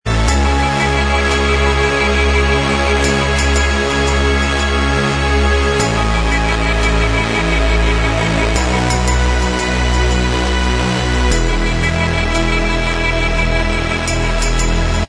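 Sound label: remix instrumental track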